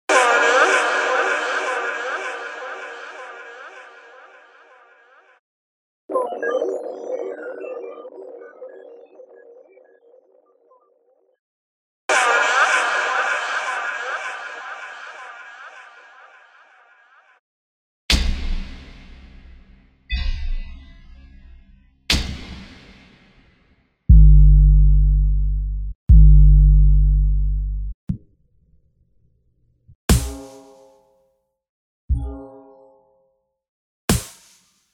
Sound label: decompose; groove agent 05